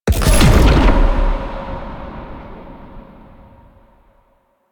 Hazard Fracture Disable; Warframe; Duviri; Undercroft Hazards

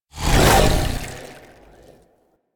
Dodge Cast; Warframe; Pacifist

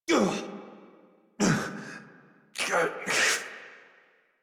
Struggle Long, Adult

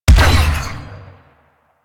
Artillery Shot; Warframe; Vehicles; NechroMech; Abilities

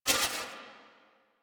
Bow Full Draw; Warframe; The New War; Quests; Weapons; Omicrus

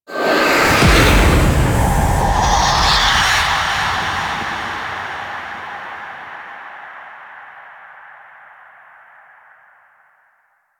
Ship Fly Start; Wraith; Warframe; Quests

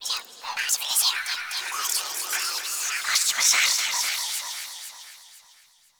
Quest Baby Whispers Hint; Friendly; Orlick

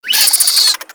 Infested Minion Pain; Warframe

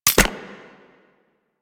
Sidearm Mech; Weapons; Warframe; Tenno; Wraith Sidearm

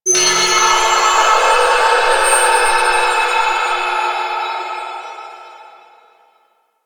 Breathy Bell; Ambience

